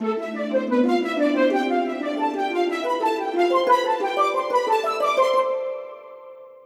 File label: escalate anticipation; Fluffy Favourites Megaways